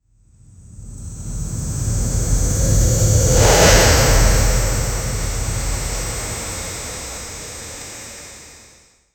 sound effects rumbling; energy explosion

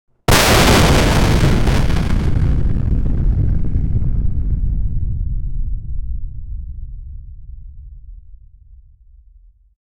2d fire ball throw and, explode

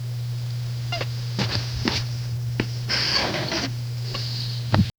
soft wheelchair wheel cre

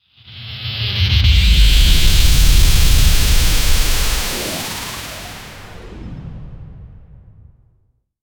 a scifi nuclear star spaw

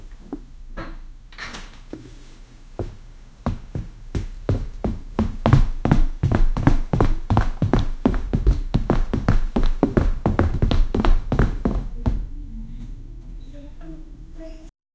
running on office carpet, footsteps

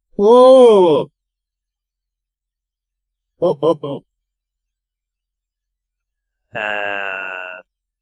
ah sound effect on funny, fail

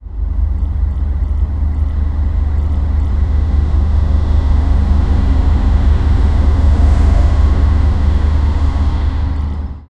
train rumble; transportation

